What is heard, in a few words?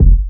Kick; Ableton Live 12 Lite Core Library